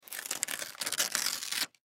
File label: Calendar Paper Large Page Rip Small; Office; Blastwave